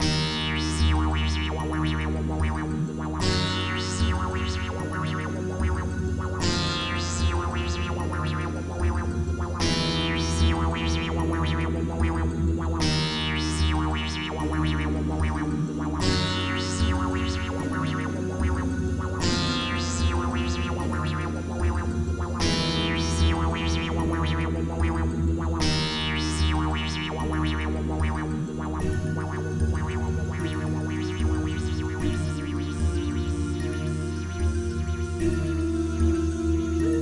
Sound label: musicbox